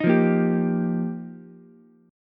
F 9th Suspended 4th F Ukulele C6, chord; strummed; JGuitar

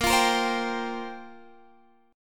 F Suspended 2nd Suspended 4th A sharp

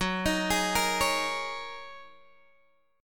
F sharp 6th Add 9th, down, Guitar, Open G

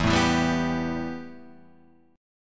B 7th Suspended 4th Sharp 5th E

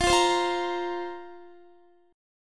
F Suspended 4th F, Guitar; Fsus4; strummed

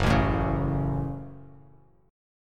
F sharp Minor F sharp, Bass Standard; strummed; JGuitar

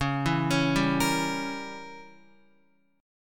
Db Diminished 7th, Guitar; arpeggiated; JGuitar

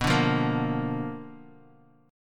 B Minor Major 7th Flat 5th Guitar; strummed; JGuitar

